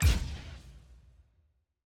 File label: emotes place